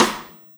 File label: Snare Shard; Sonic Foundry (Sony Creative Software) - Processed Drumkits Zero Gravity Beats; Shards